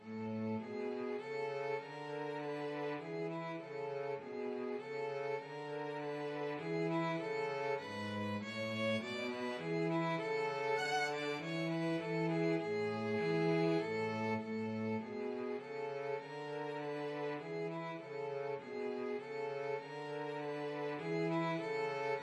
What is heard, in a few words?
violin cello duet